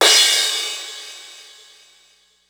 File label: Dance Crash, Ensoniq ASR-X Pro